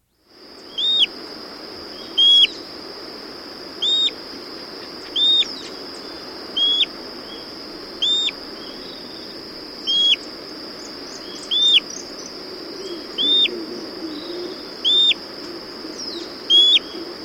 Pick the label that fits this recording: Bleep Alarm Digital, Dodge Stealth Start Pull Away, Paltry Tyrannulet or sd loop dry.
Paltry Tyrannulet